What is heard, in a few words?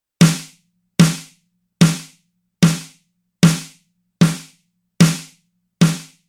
Parameter Pan